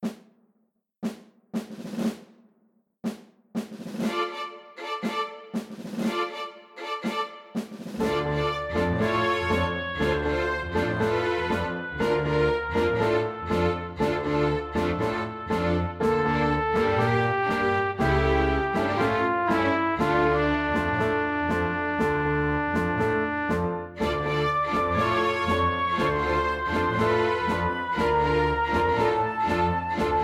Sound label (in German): Tango Orchester; Seiber